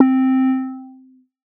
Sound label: auto Wah